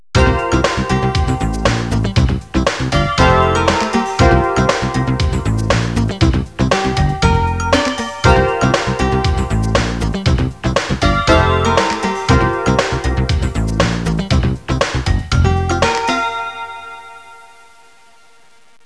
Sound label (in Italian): suonerie businessy